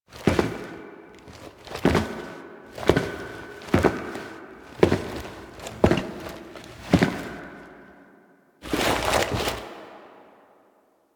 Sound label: Walk To Sword Steps Foley; The Second Dream; Stalker